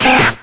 rattata, pokemon cry

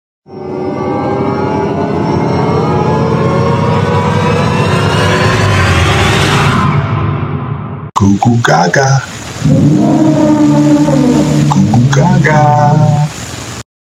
dramatic goo goo gagaaa

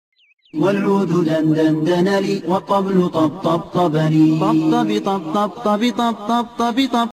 arabic cat

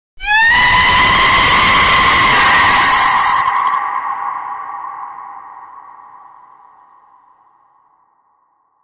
chicken screaming on tree loud warning

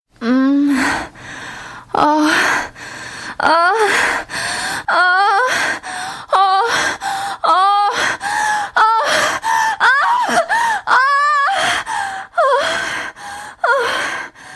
king laugh; clash royale king

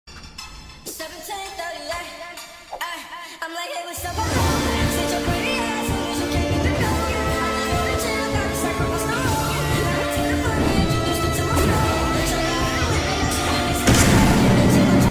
trap royalty meme

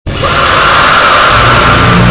faahhhh slowed and reverbed

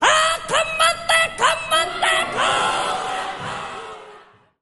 maori haka

